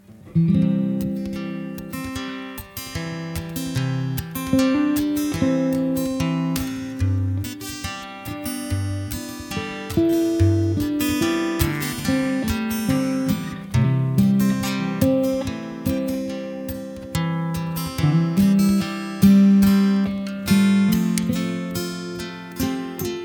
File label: Jam